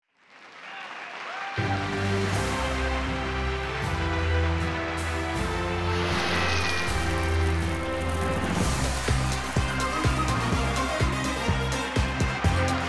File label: Intro Fanfare; Buzz! Brain of the UK; PS2; RUS